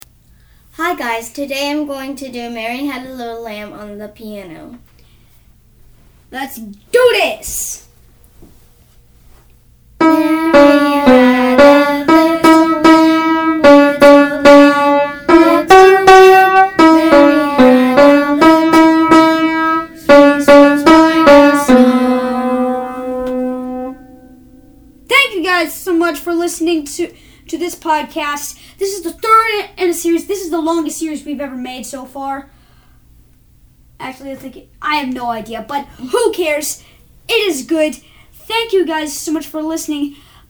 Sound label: piano beats